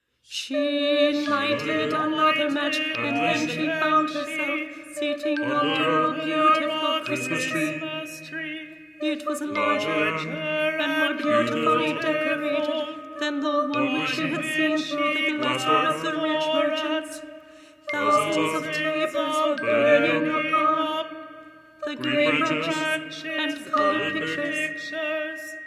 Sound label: 4 Voices & Percussion; No. 10, She Lighted Another Mat